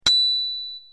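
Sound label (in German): Fahrradklingel; bauarbeite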